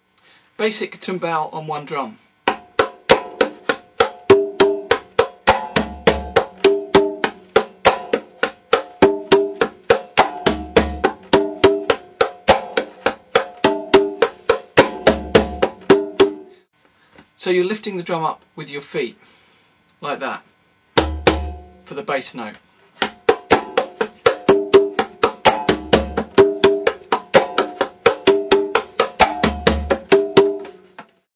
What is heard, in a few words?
tumbao one drum, conga